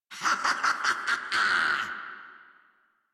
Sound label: Laugh; Warframe; Grineer Catbrow Handler